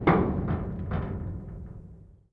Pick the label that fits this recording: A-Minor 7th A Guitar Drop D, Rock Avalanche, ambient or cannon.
ambient